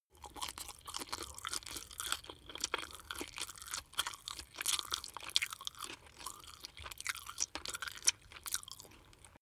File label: chew spaghetti smack medium